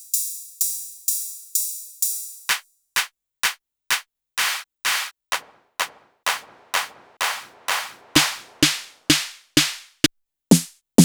Drum Samples

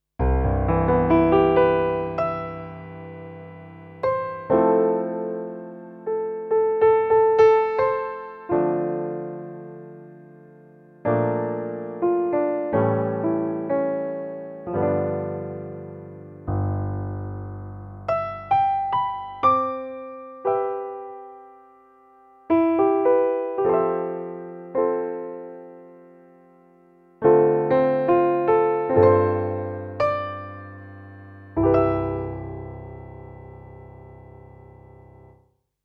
Alesis Concert Acoustic Piano

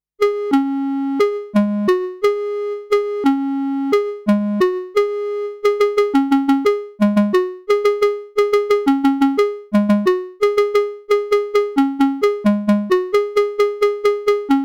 FX Arpeggiator

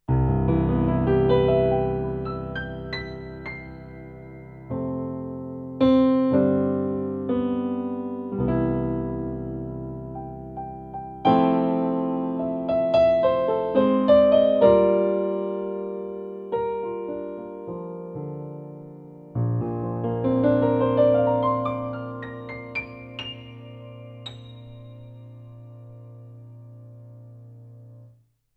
CA17 Concert Grand

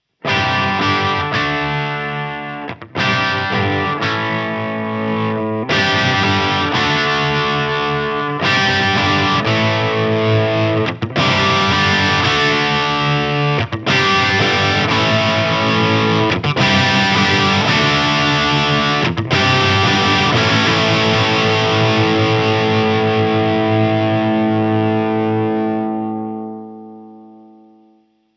Cort KX300 Crunch Volume Drive

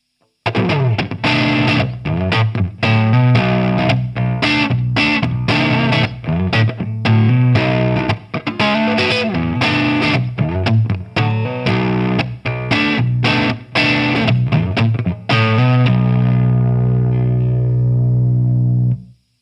Chapman Guitars ML3A Baritone Crunch Neck Pickup

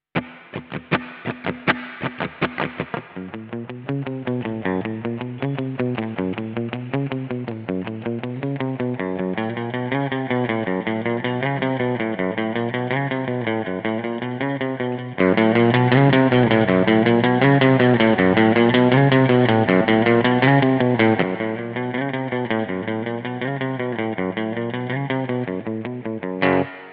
Volume Boost; Hotone Diamond Mojo3

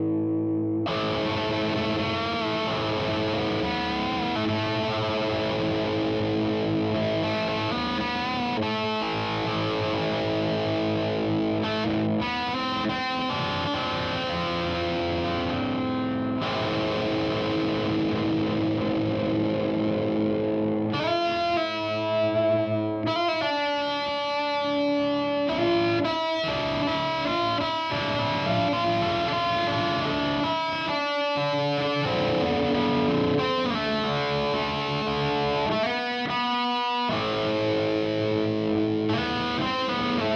Chime; Strymon Iridium

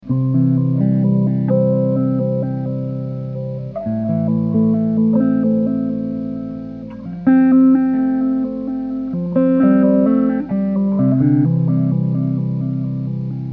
stingray multi filter; source audio soundblox 2

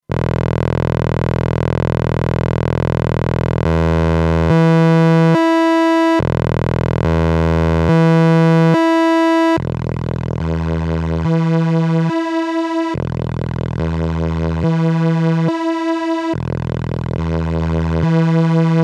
mp4 1osc saw; elkorus

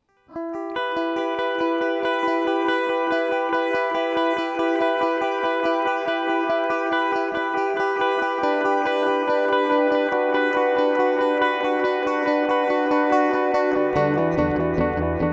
PING PONG

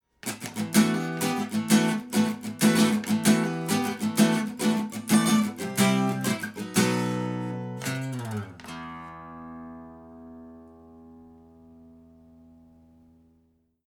Epiphone EJ-200 SCE VS Funky Strumming

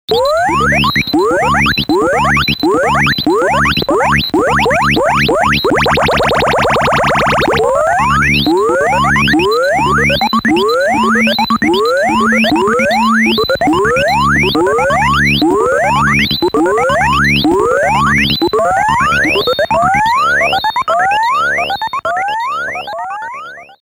Talking Synth

